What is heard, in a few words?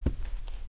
player carpet; Group Mapping Project